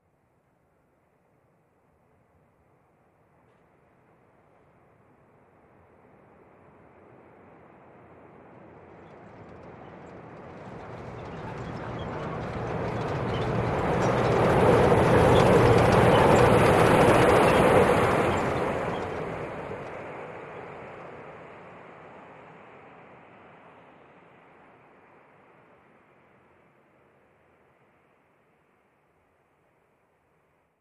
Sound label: El Train By, chicago El